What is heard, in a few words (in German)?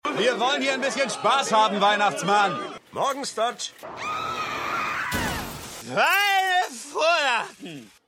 Schreiende Frau; Bewohner 2; Jim; Autofahrer; South Park